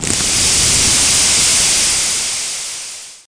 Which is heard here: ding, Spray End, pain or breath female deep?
Spray End